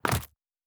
Build Interface; Survival Game Sounds - Lite Edition

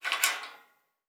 Metal Door Handle; Survival Game Sounds - Lite Edition; Doors

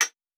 Blacksmithing, Crafting; Survival Game Sounds - Lite Edition

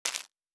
UI Simple, Interface

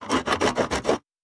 Saw Wood; Survival Game Sounds - Lite Edition; Crafting

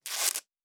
Tape; Survival Game Sounds - Lite Edition; Objects and Items

